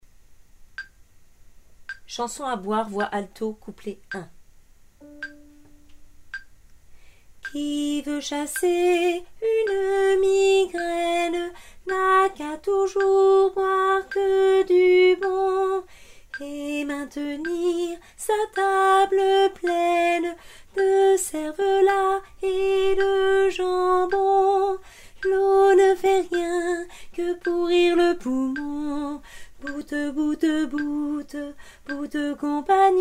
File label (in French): chanson a boire alto